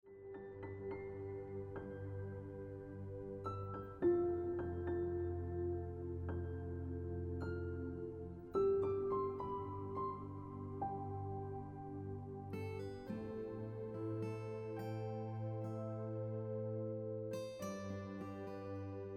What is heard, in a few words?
Piano Music